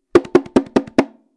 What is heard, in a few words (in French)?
frise, congas